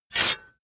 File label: sword cut hard slash